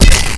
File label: duck stab hit